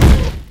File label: dog footstep run; Garry's Mod; hl2